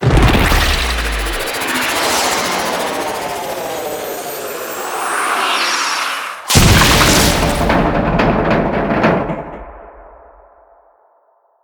Crash; Vor; Liset; Warframe; Tutorial; Cinematics

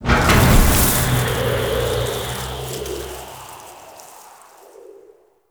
Door Unlock Stinger; Venus Open World; Objective Rooms; Deck Twelve

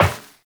Step Metal Perforated, Footsteps, Walk; Warframe; Characters; Tenno

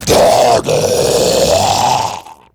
Death, Dialog Barks Battle; Warframe; Grineer Ghoul Devourer

